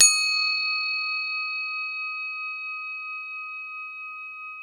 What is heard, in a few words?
CROTALE D